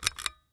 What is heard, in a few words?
String Light Bulb String Pull, Household